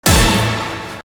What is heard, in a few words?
Kickflippa